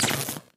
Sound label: tether error